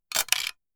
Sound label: Camera shutter click